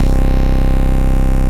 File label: Basses; Ableton; Factory Packs; Creative Extensions